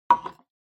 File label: Hourglass Put; タイマー機器